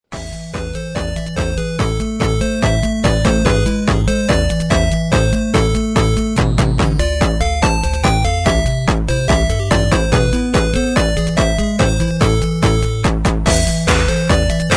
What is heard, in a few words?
tetris russian theme